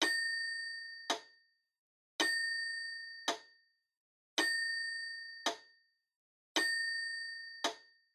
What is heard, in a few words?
Metronome (Low-Bell-Click-Slow)